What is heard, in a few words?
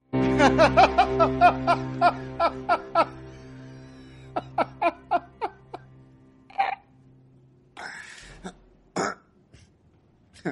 ketawa joker the joker laugh